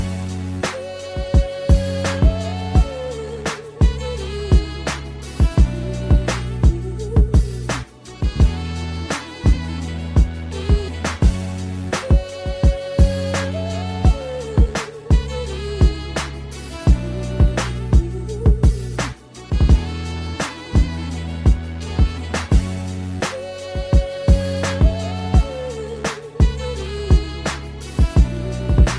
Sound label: saxophone jazz beat